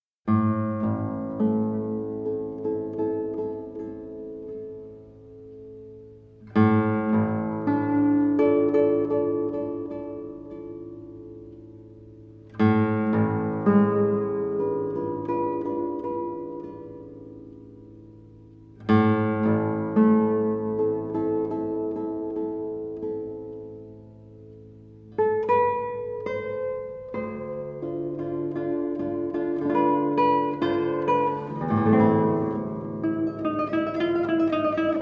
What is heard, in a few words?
pianto